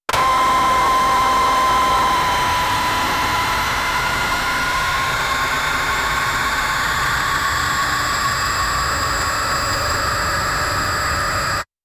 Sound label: a sci fi cyberpunk; badass